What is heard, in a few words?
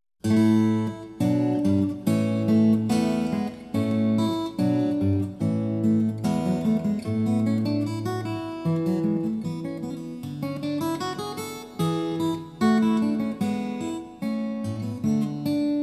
recercar, steel string guitar; The Siena Manuscript